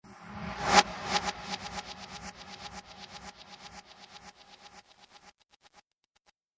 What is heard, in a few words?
Fast Repeating Space Swish; Whoosh Sound Effects